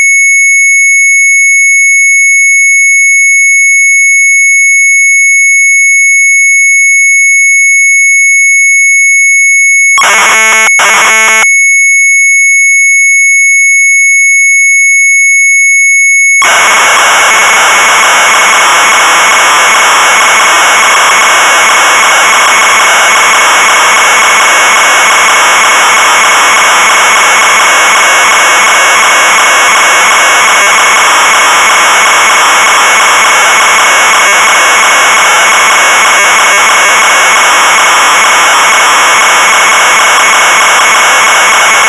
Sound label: MZ800 Tape; CHAR.PROG; sharp; retromelsarchive